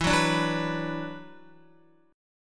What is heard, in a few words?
E 7th Suspended 2nd, down, Guitar; E7sus2 strummed